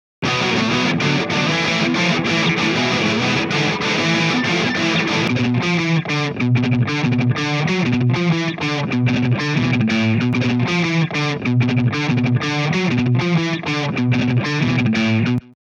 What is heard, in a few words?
Guitar